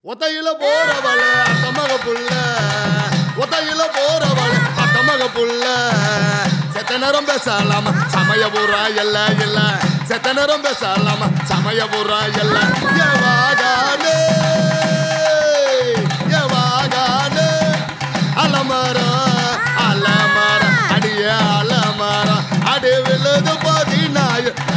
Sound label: Thiruvizha Song